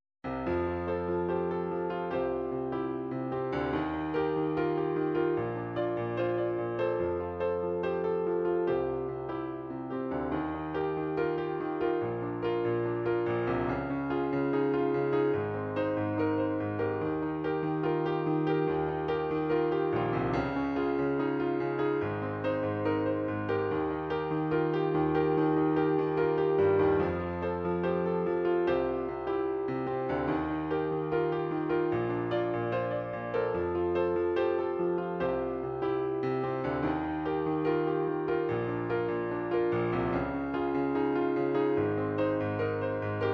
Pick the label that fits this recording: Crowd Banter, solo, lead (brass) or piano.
piano